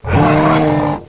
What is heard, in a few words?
Beast